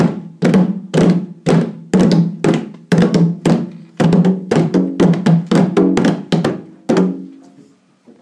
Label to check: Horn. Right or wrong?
Wrong